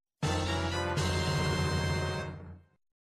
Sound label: item found; zelda